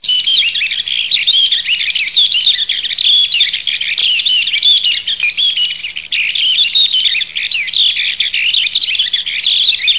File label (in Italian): BECCAFICO